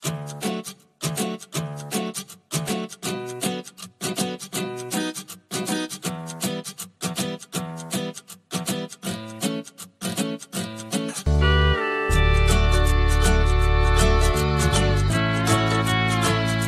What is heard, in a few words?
awesome instrumental ringtone